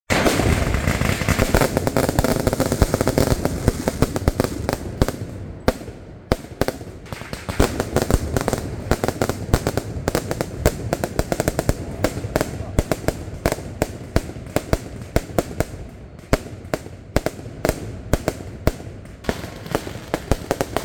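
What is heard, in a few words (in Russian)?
feierverk, fireworks; Звуки, которые можно услышать только на праздниках и различных мероприятиях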